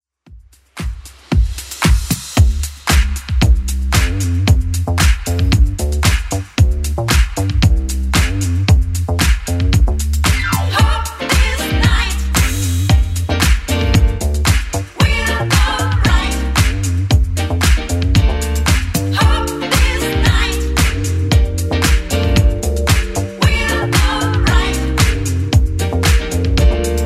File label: 80s Club Edit 114bpm